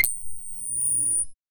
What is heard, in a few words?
a neon tube light switchi